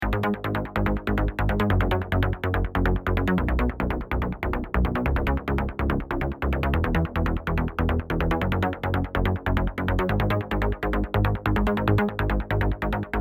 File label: Rhythm Emotion